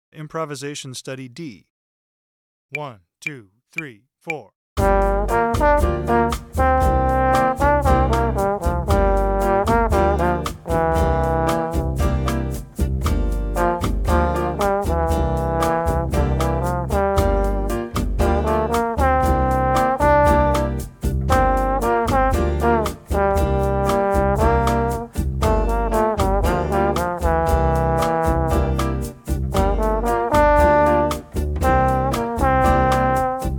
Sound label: bossa improvisation